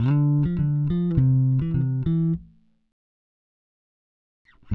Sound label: Jazz bass, Rock Bass